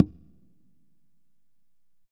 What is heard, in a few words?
CONGAS WET; Q Up Arts; Denny Jaeger Private Collection